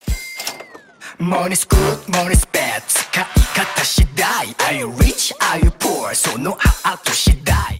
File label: Rap